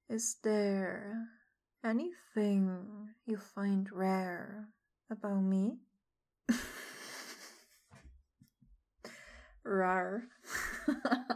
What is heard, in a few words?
rawrr